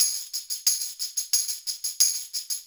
- Brass Tamb; Rock; Percussion

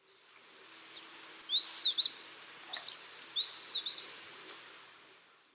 Harpagus bidentatus, call; S. America; Eastern Lowlands

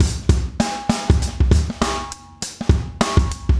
funky 100

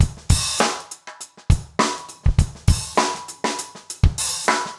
basic funk 100

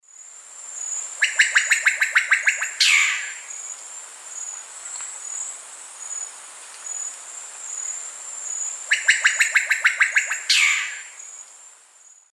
Cacicus solitarius, call; S. America; Eastern Lowlands

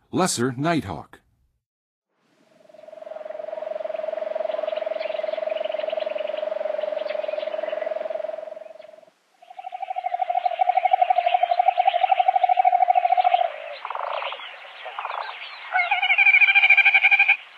Lesser Nighthawk, Songs of Birds; North America; Western USA; Western USA Songbirds

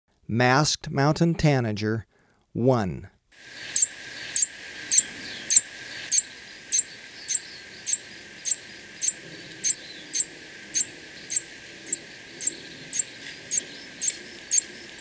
Masked Mountain-Tanager; S. America

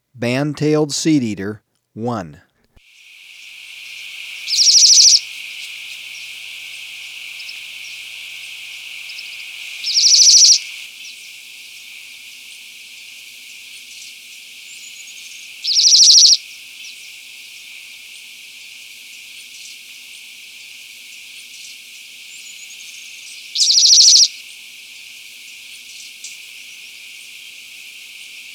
Band-Tailed Seedeater; S. America

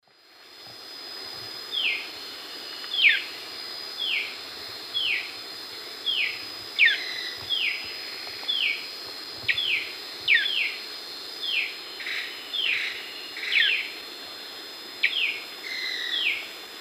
Myrmotherula ignota, disturbance calls; S. America; Eastern Lowlands

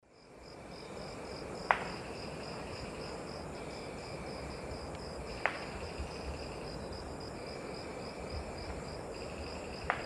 Neomorphus pucheranii, bill clapping; S. America; Eastern Lowlands

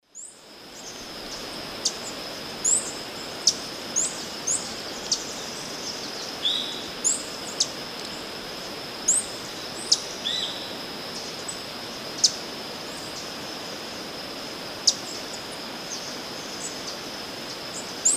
Tangara chilensis, song and calls; S. America; Eastern Lowlands

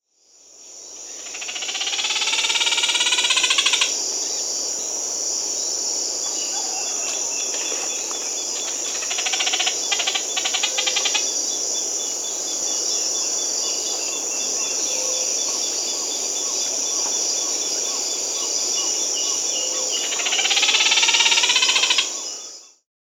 Peruvian Recurvebill (Simoxenops ucayalae), Peru; S. America

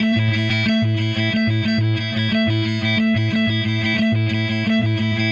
Pitch Delay Guitar; Guitars

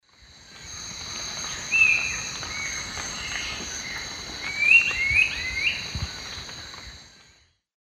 Campylorhamphus trochilirostris, call and subsong; S. America; Eastern Lowlands

